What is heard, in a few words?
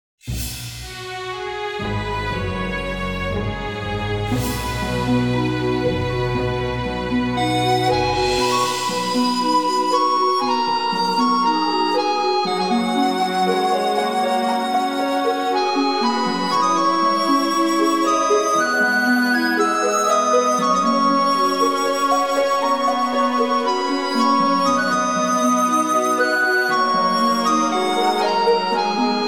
fairy flute